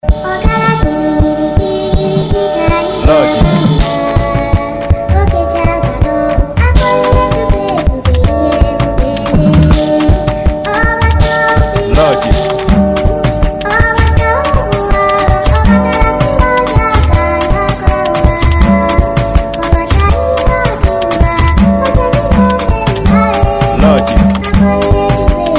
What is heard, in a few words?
Professional beat